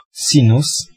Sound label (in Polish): sinus